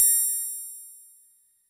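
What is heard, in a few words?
Fuzz Clav DX100 A#1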